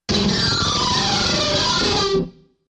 Solo Guitar Riff

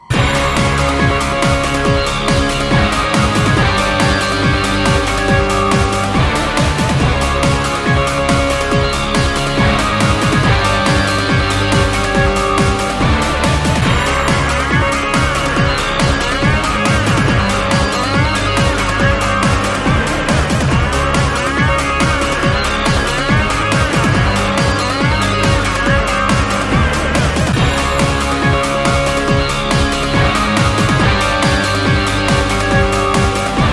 Looping

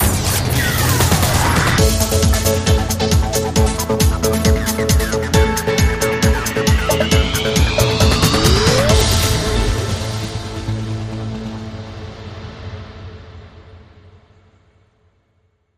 Ramp Edit

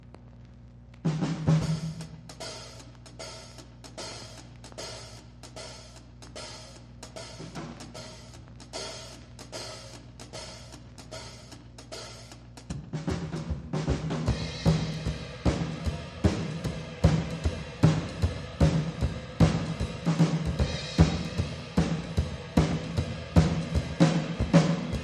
Drums

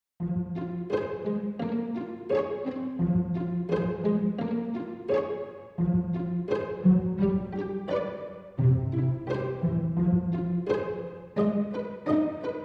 Strings Only

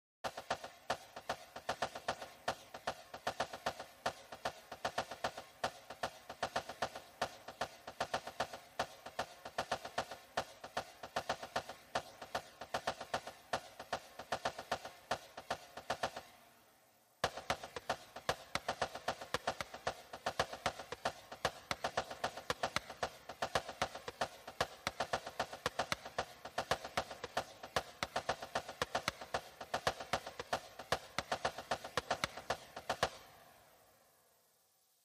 Tense Percussion

